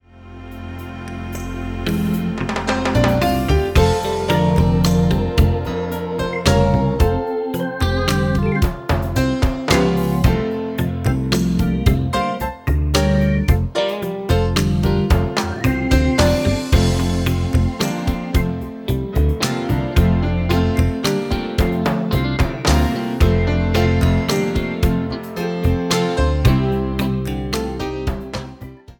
Frieden mit Gott Playback; Christmas-Party

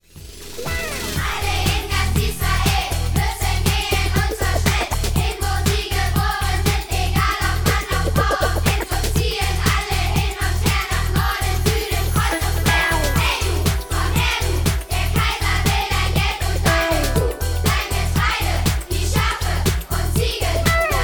Steuer-Rap

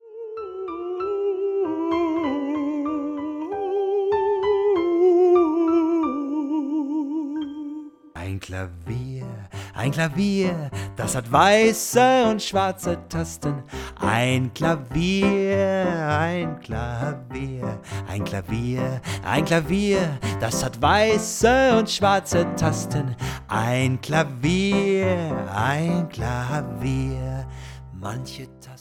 Lied- Ein Klavier

